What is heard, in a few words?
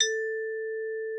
TRIS A4, VIBES; Xsample VOL-4